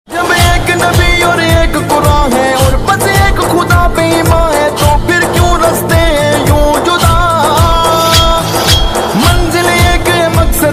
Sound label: islamic ringtone